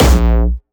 A#1, Jumpstyle Kick; Bassdrums; Jumpstyle Multis; vengeance essential club sounds-3; VEC3 Bassdrums

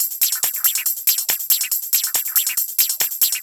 Loop 140 BPM; vengeance essential club sounds-3

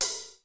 HAT, SR16; musicradar-synth-samples; Classic drum machines

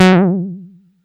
Bass Juno F#; Vengeance Samples

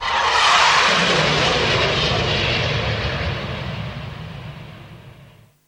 Downsweeps

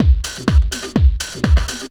drm 125, drumloops bassworx; house; PS-28 Vocal House Samples

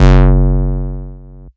Root F#, Bass; Vengeance Samples

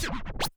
Cuts, Vinyl Record Samples

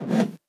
BD Gate FX, Bassdrums; Vengeance Samples

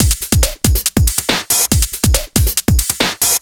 Breakbeat 140 BPM

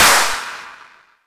Clap; Vengeance Samples; VEC1 Claps

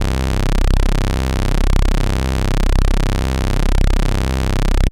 Taurus 2 C1, Moog Voyager; Moog Voyager Sounds; VES1 Taurus 2

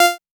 Synth Sound; Vengeance Samples; VEE Synth Shots

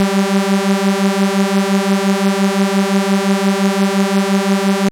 Taurus 2 G3, Moog Voyager; Moog Voyager Sounds; VES1 Taurus 2

